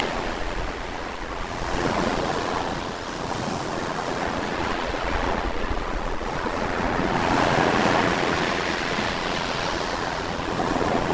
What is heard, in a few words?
waves